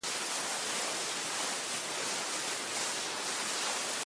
Snow loop

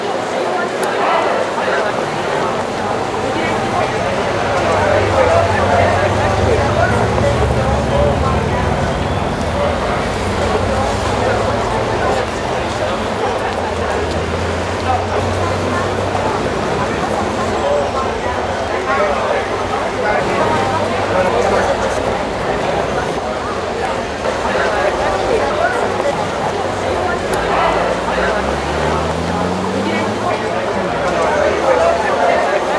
Plaza Ambience; Splatfest

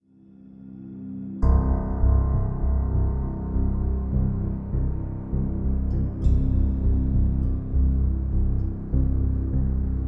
Story (e sel music)

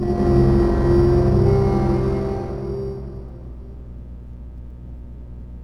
Retry Jingle; Dark Forest; Friday Night Funkin' Mario's Madness; PC mod gamerip

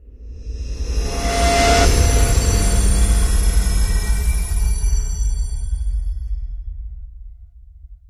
Swell; friday the 13th killer puzzle

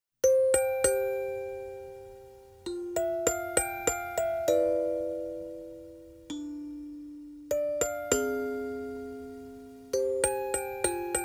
Magic Lantern -Orgel Ver.-; Gekkou no Carnevale

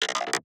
robot alert; teardown ps5 windows xbox series xs gamerip 2022

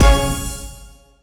BGM Gameplay Goal Fin

